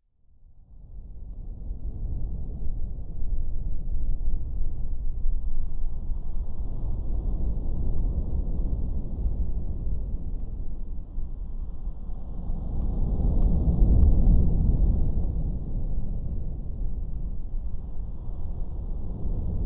science fiction deep space spinning planet ambience drone airy movement slow whoo; zapsplat; slow whooshes